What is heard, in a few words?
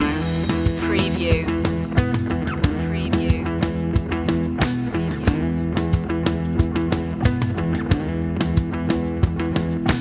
rumble in the jungle loop